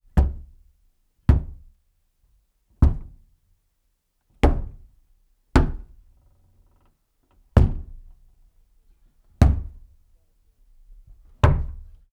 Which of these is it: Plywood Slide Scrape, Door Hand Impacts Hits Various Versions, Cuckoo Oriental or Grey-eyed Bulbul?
Door Hand Impacts Hits Various Versions